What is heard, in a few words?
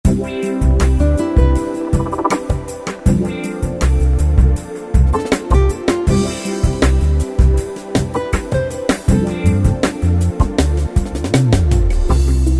Chillout, Ballad; Yamaha PSR-3000; Factory Styles